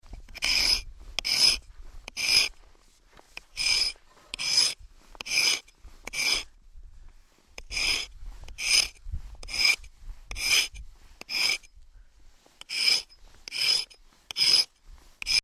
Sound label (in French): aiguiser un couteau